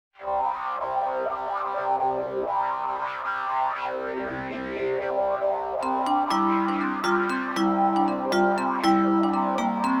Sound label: thunderstorm